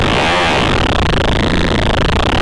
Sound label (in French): moteur